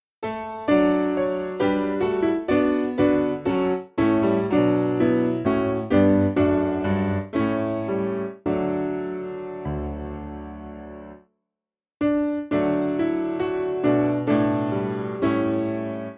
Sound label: Give Us O God Solothurn Piano, Free Music Backing Files for Churches; Simple Piano